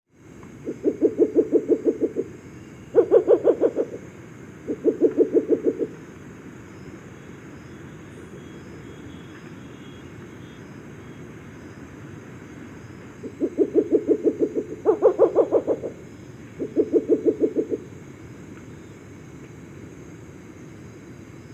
Song from pair and hoot at dawn, Birds of the Costa Rican Cloud Forest